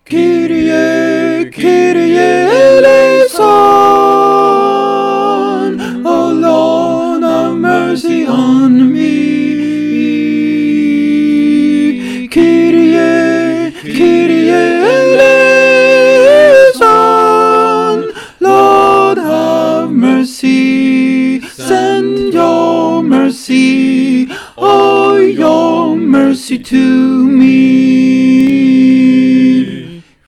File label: Kyrie sopranos